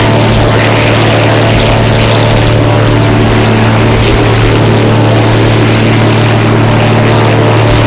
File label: zlin rear, engines; War Thunder